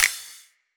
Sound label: hold end; ichni; Wwise